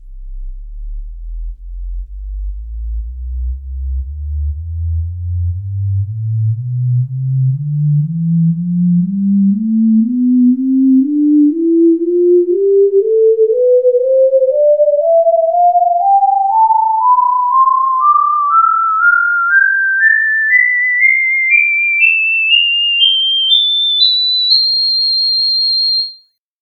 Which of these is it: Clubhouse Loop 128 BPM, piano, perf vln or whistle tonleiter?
whistle tonleiter